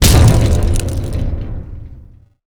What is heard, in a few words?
glass land hard